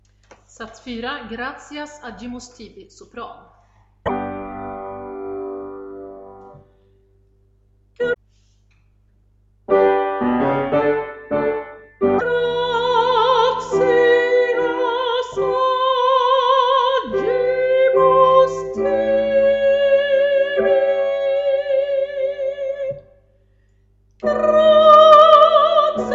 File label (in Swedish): sopran; Gloria; Gratias